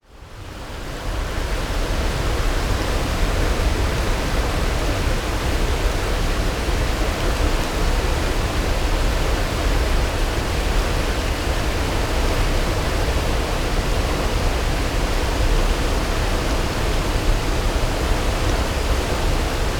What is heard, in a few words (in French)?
chute du poirier